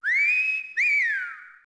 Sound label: WHISTLE, construction; zones